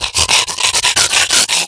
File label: Gorefast infected; zombie plague